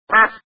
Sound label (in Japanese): ahiru